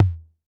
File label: tom